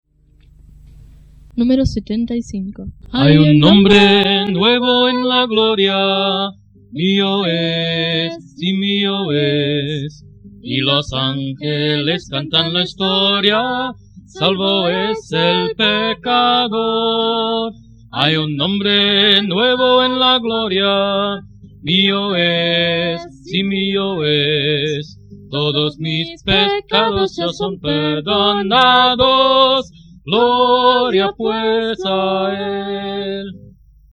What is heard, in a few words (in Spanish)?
Coro, himnario niños